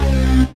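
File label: Rave Synth, Dance Mega Jungle Rave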